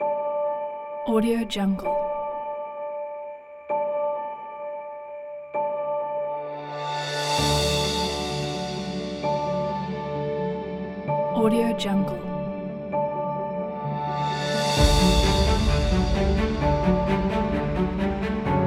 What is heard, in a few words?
inspiring and emotional epic orchestra kit